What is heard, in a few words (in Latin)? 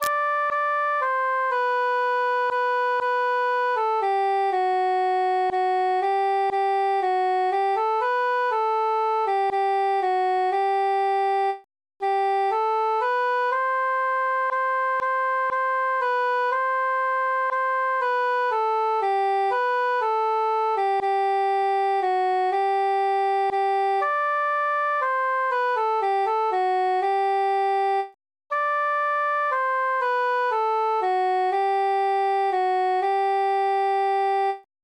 quintus solo; sing we and chant it